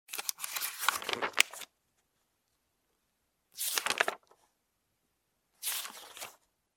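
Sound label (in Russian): страницы книги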